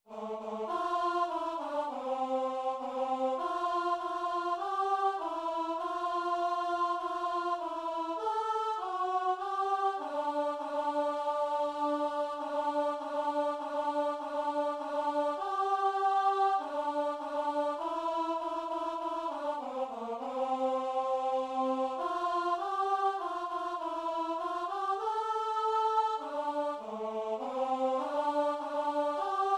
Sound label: alto; AR Himen; machine-generated